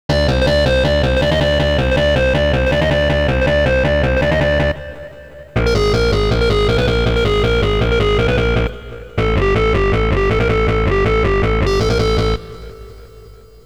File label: mono high frequencies; the meditation soundset